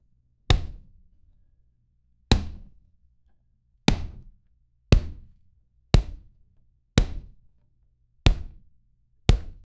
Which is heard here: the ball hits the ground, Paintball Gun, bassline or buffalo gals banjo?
the ball hits the ground